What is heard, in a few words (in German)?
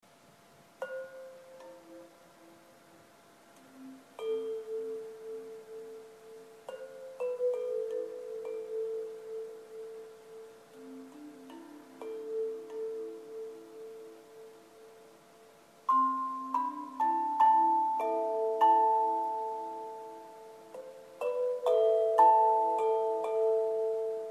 Verrophon